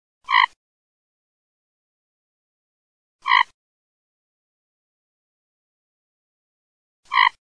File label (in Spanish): PATO MOCO